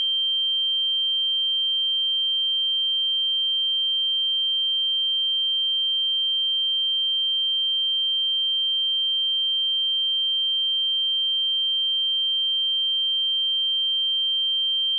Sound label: Wow And Flutter Test Tone Computer Generated Reference